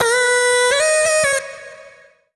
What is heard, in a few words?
Vocal Sample Pack Phrases B; Slate Digital Omnivox Vocal Sample Pack